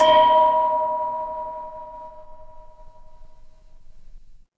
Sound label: instrument singing bowl